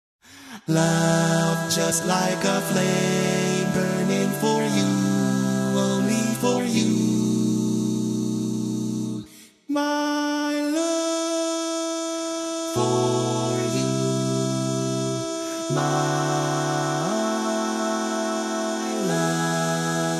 Lead, barbershop tags

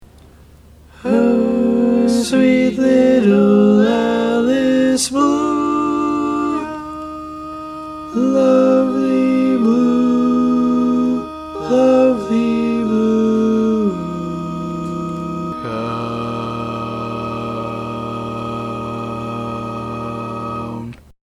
Bari, barbershop

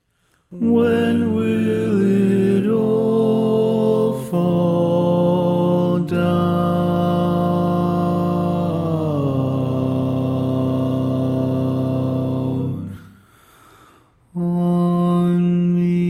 Lead

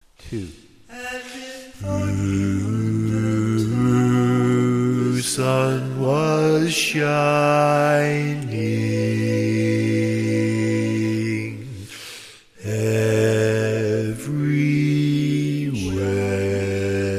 bass

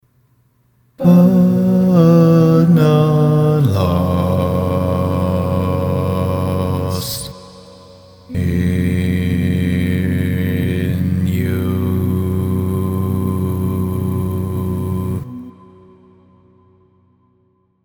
barbershop tags

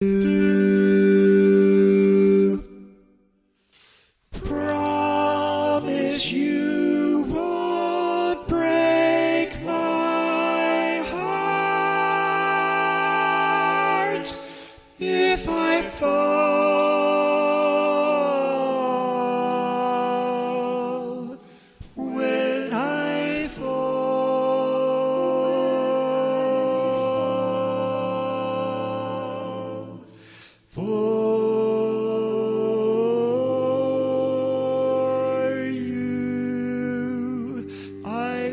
Lead, barbershop tags

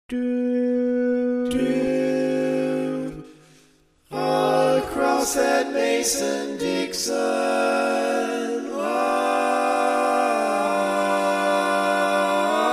Bari, barbershop tags

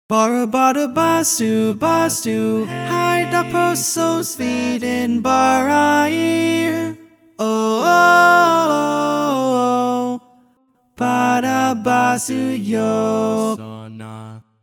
TTBB Lead, barbershop tags; Each recording below is part predominant - one part louder, other parts quieter.

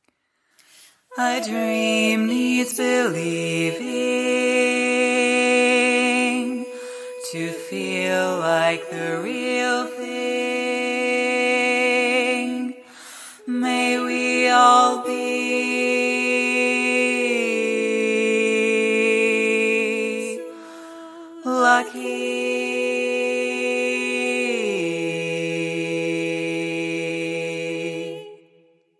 Bass, barbershop

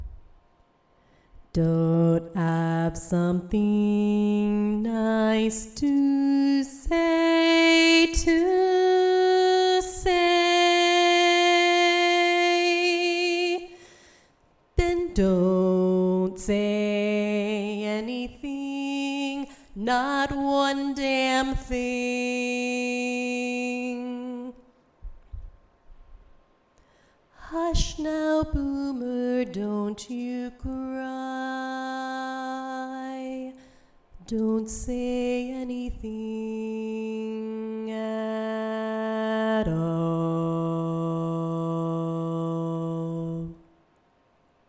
Bass

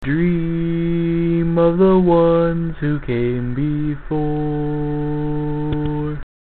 Bass, barbershop

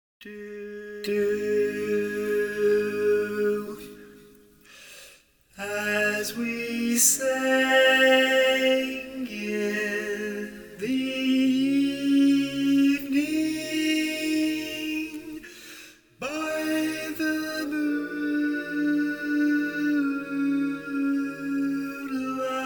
Lead, barbershop tags

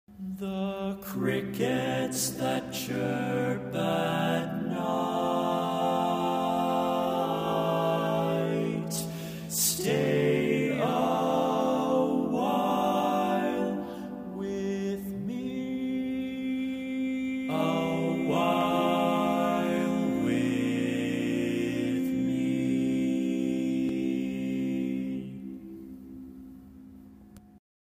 All Parts, barbershop tags

